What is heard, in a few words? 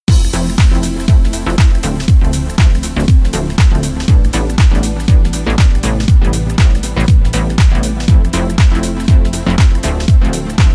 Synth Side Chain Hard